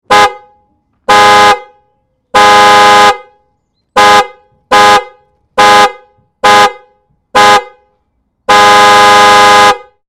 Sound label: Car Hooter 3 - Big car honking various; Cars; Vehicles; Tom Hutchings